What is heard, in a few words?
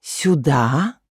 Female Human Attack; Hearthstone; датамайн обновления 5.0